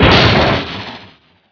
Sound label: Gun